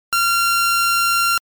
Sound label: Saw 1380